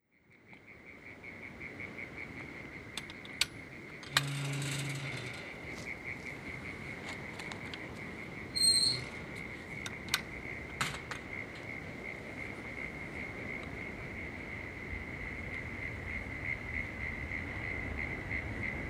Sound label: woorim beach crickets